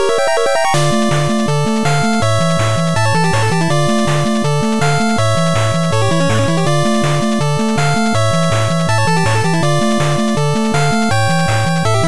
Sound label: 8bit Lets do this